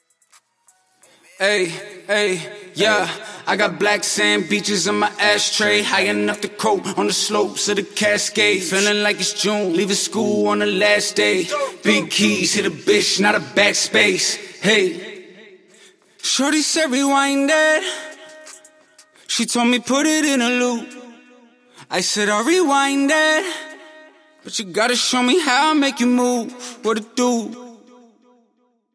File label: 174BPM Key Am, Acapellas